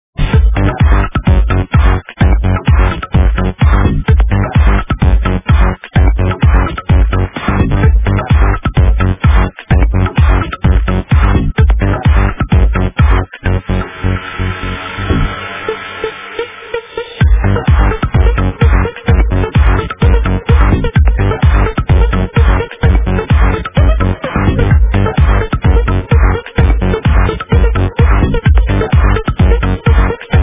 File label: electro voice; трек на звонок